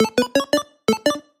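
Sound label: sounds for notifications on phone in 8-bit style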